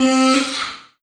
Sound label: Omnivox oneshot C, Vocal; One Shots; Slate Digital Omnivox Vocal Sample Pack